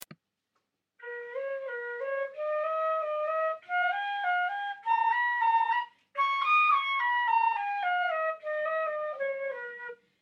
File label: flute solo